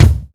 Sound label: clean kick single hit d sharp key; kick drum samples